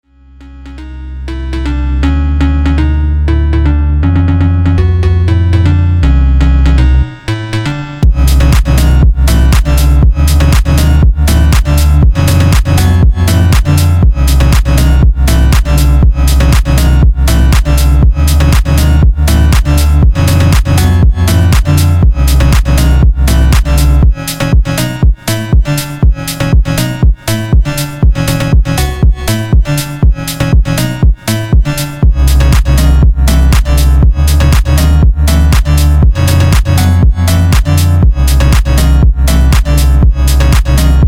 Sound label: FONK